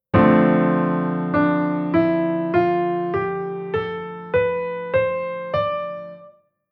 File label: Chord Chord Scale Major